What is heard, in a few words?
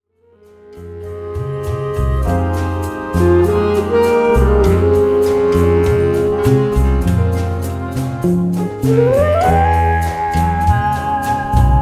batucada jazz